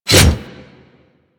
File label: Ghoul Devourer Melee Swipe